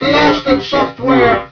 death; hunger; franklin

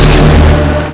hornet hit; svencoop; hunger

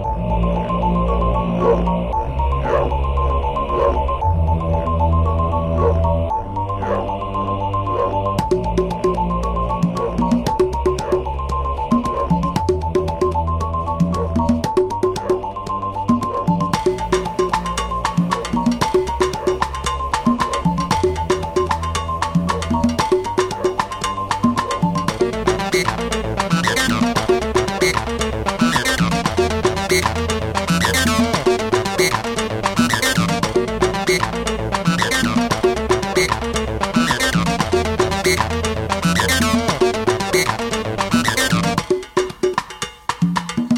caves, music; svencoop; mommamesa

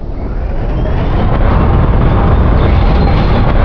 main elevator; svencoop

